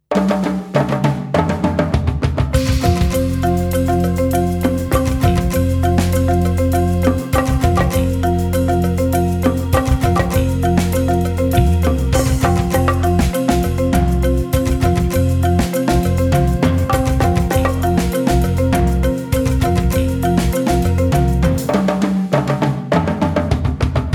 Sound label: African Song Double; DPA